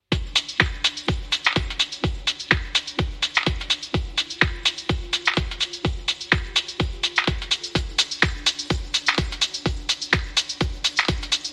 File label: melodic organic house techno electronica; eversummer podcast